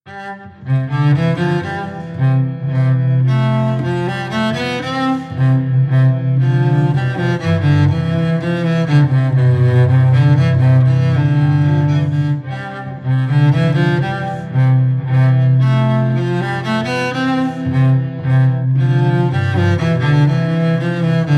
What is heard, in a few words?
with bass bar, violins